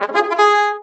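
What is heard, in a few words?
victory fanfare sound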